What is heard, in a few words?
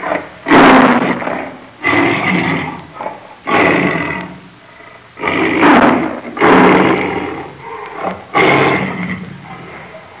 Jungle tiger growling and roaring